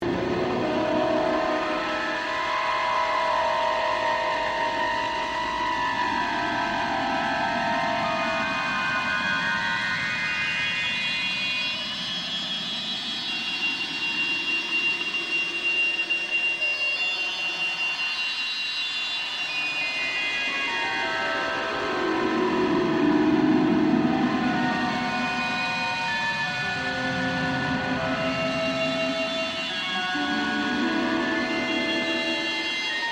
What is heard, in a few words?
Screech